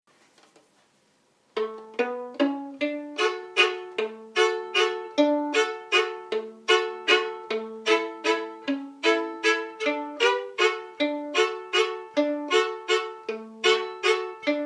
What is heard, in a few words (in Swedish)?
Plockkomp; Håvaleken efter Henning Snitt